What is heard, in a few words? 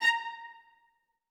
A4, Orchestra; AKAI Advance Orchestra Upgrade 97 Vol.1